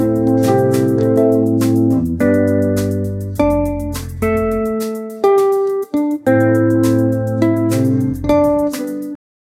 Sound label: modern soul pop intro smo, funk